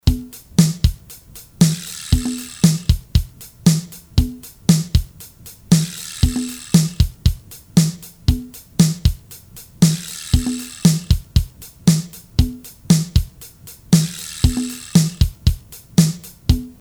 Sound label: Rock Steady; Akai MPC1000; Flash memory demos